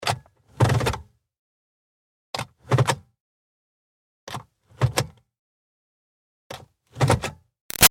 Car Highlander Gear Shift Switches; 2005 Toyota Highlander V6; Cars (Sound Effects:Vehicles); Big Room Sound

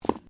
debris

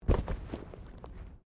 Body movement Impact Roll; Body movement foley to simulate falls and deaths; Rolling; Objects

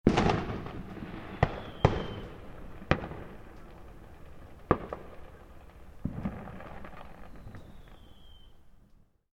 Fireworks Exploding In Distance; Backgrounds; Firework Sound Effects; Fireworks (Sound Effects:Backgrounds); Stuart Duffield

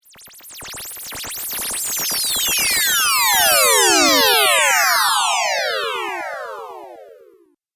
Teleport Warp Video Game

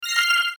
Mouseover High Pitch; Multimedia; Blastwave

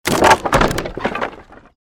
created wooden barrier smash medium

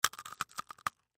Human Bite Food; Bite Food Pretzel Slow Crunch; Eating; Blastwave

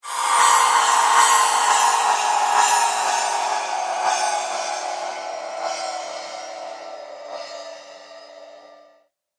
Reverse Cymbal Whoosh

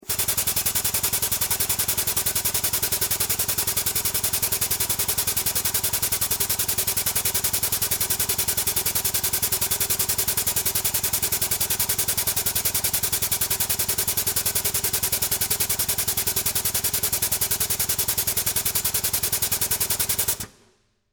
Pneumatic Floor, Air, Burst; Scifi, Pneumatic, Air, Burst, Science, Fiction; Spacecraft; BlastWave

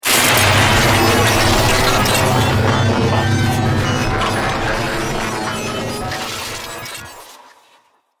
Dubstep Glitch Transition; Transition Sound Effects

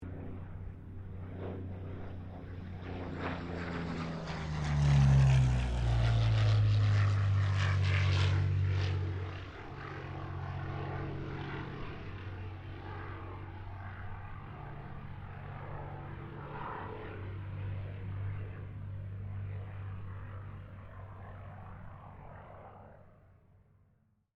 AIRCRAFT P-40 KITTYHAWK PASS STEREO

